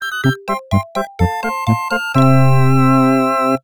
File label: Jingle [Comrades Jingle 1 (Happily)]; Nakama; Shining Force Genesis